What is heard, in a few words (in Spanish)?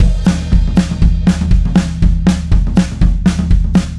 Loops mutantes